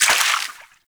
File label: Water Sprayer Surface Impact; Warframe; Weapons